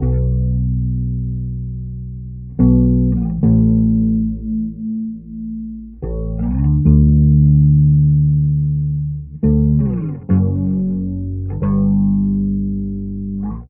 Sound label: bass star power, Loops, Sad